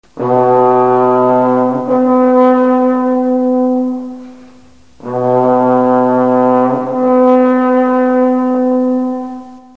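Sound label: gathering, signals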